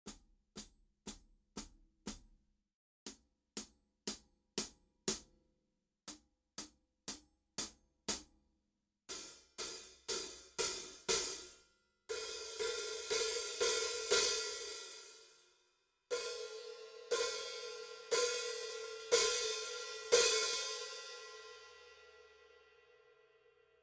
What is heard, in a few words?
High Hat 14 - Hickory Sticks